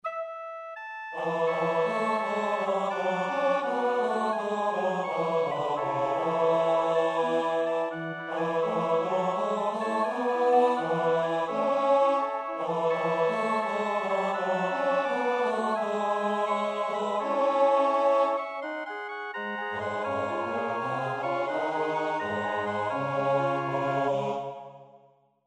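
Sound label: soprano alto tenor bass; Maunder (John Henry); Bethlehem; Deel 3 (Our gift)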